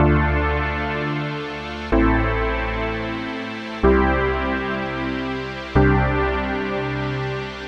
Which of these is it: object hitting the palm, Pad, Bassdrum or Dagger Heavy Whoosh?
Pad